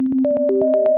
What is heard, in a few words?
122 BPM Arps A maj G min Tonic D; arp essentials